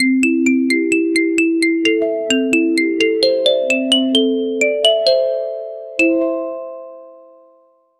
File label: a short cheerful success, jingle